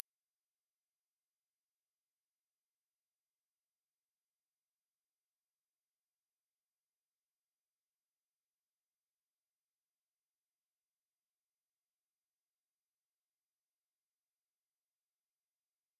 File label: 20000Hz 20kHz Sound Sine Wave